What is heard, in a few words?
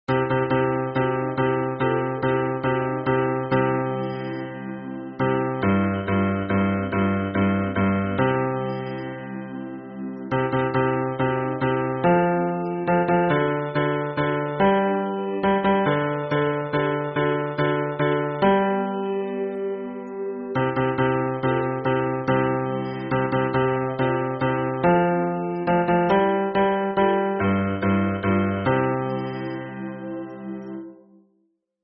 hymn bass